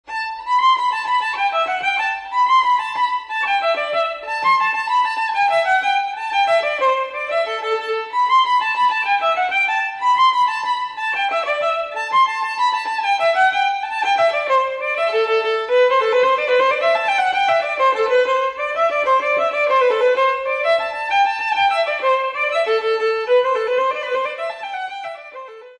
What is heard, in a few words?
high jig